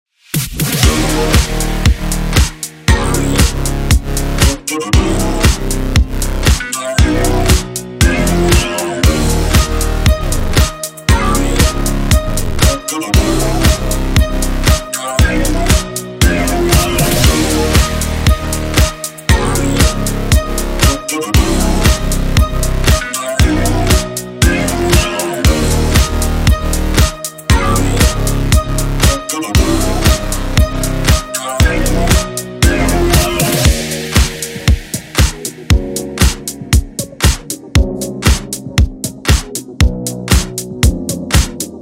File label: Disco Distortion